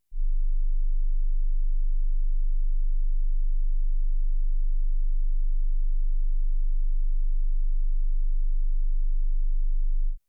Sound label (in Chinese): 25Hz測試訊號; 雨果金碟